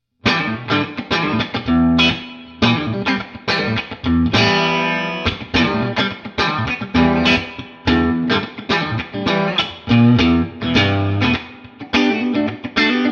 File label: Tele Clean; super 30